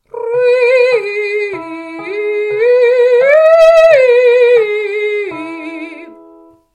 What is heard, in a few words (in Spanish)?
Catedral